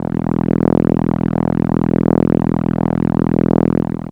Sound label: Contra E1, ARP Solina, contra bass; musicradar-synth-samples